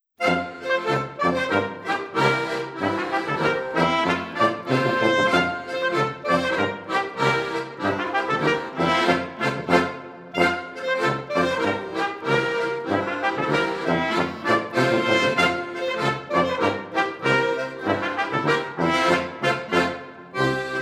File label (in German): Aprilscherz Schottisch